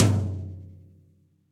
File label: Tom Floor High Bright Room, Drum Hits; Standard Hits; Ableton; Factory Packs; Session Drums Studio